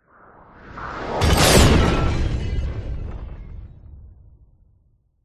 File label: explosion whoosh into a weird boom breaking glass